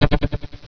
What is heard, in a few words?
red small explosion